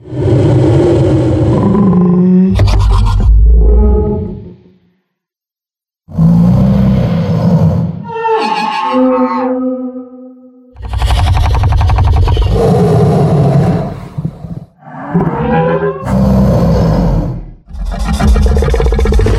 Glyphid Bulk Detonator Idle Loop

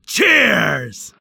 Bar Cheering when drinking

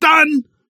Claim perk Points

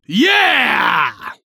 Bar Cheering When Somebody Orders Drinks

